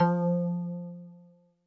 Jazz Harp Kawaii F2; keys

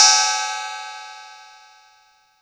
Cymbal; TRX

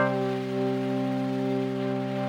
Chordal Reef Trumpet C3

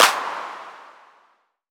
CLAP

